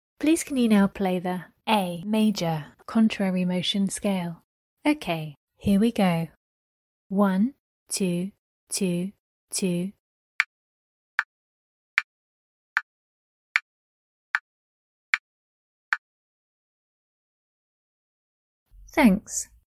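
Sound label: A major contrary motion scale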